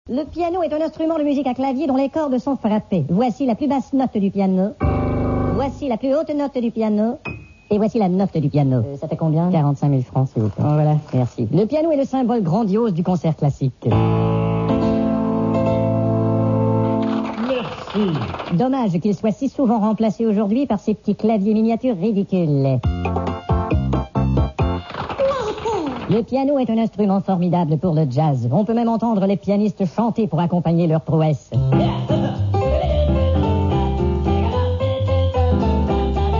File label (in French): Le piano; musique du peuple